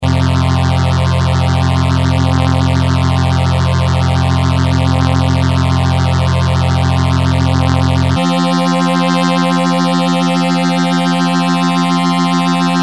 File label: prodigy mutron phasor II